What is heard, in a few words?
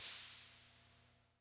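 open hi hat, wurlitzer; classic machines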